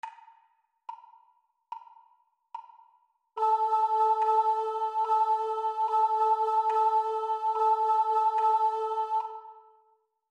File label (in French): Rythme Haut